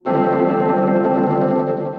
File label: Piano & Keys; Altri sogni